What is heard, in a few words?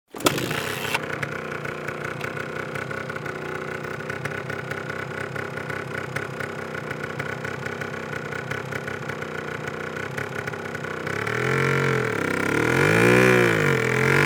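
sound of motorcycle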